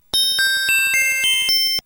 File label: Chime